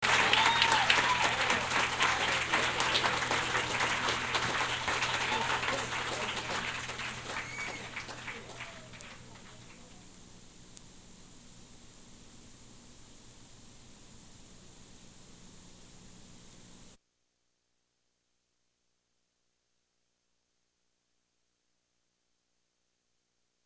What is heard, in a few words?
Crowd Noise; Blues